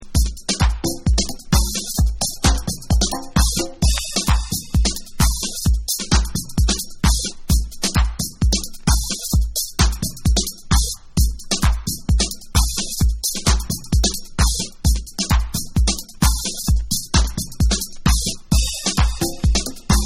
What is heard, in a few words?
Bonus Beats